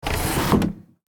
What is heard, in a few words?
Small drawer opening; household